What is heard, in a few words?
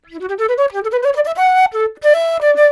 Chill Pop Flute, D; Pop Rock